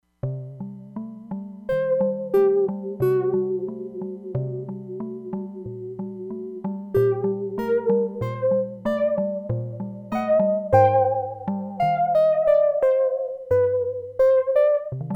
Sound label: CALIMBA SYNTH PIANO DIGITAL PIANO